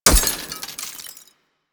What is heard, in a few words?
Ocean Glass Crack; Ambience; Warframe; Grineer Ocean